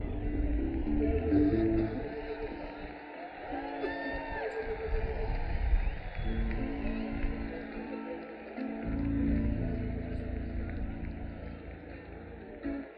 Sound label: Crowd